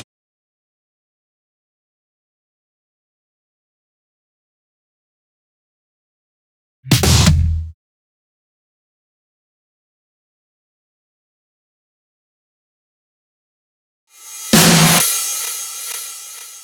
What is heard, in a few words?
128BPM Drum Fills; Vengeance; Essential Clubsounds Vol.5; Mainstage Kit; Root Fmin; Drums & Perc